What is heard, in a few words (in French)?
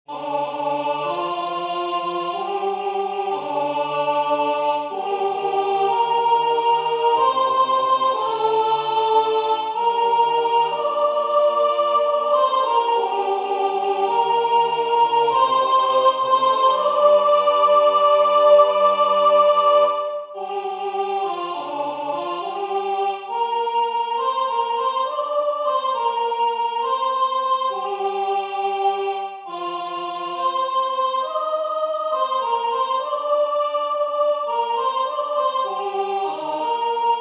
soprane; L Esprit de Dieu repose sur moi